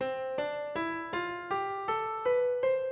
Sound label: do major dizi